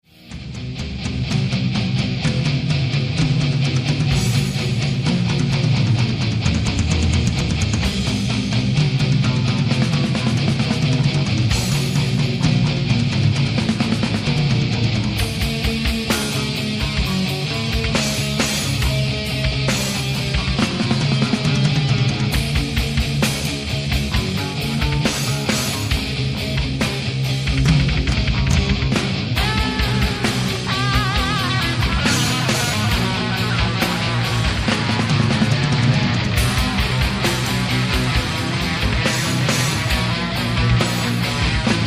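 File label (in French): guitare électrique riff solo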